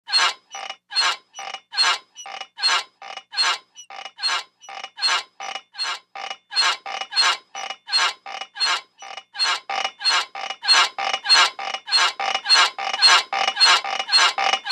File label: Bed Springs Squeaky